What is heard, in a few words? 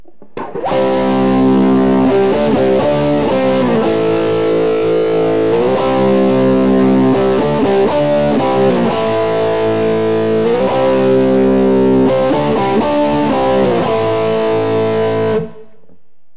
neck crunch; demon; Burny